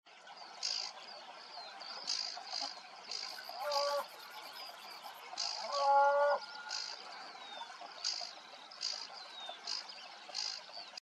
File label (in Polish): lozowka, ptaki